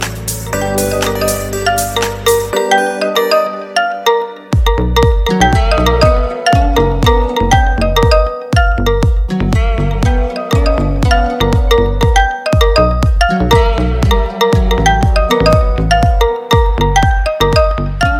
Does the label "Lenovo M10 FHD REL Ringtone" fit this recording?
Yes